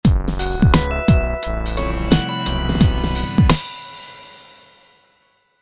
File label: Midi